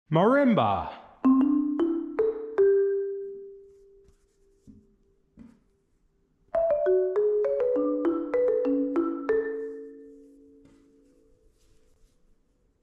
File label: Marimba